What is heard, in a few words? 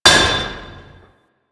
Worker Hammer Impact Metal, weapons; Grn Worker Hammer